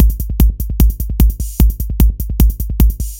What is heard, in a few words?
Rolling Kicks, Drums Beats